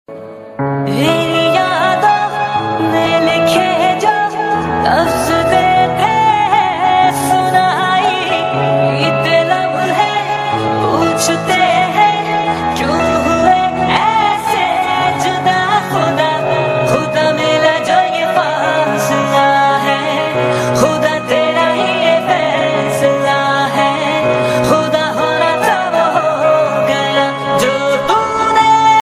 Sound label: most beautiful romantic instrumental